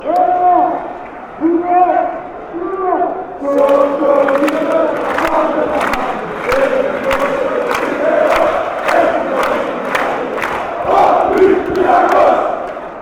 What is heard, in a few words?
chant, fanchants; olympiacos